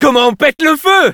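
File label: positive vocalization, French; Scout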